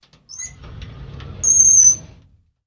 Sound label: Drawer Metal Open Qu